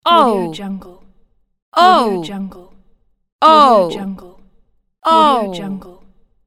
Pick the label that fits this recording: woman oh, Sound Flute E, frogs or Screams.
woman oh